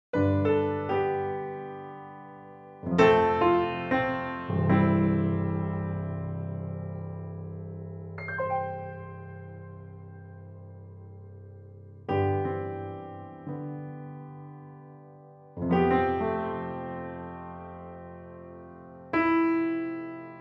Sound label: Upright, piano